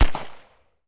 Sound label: Pistol Shot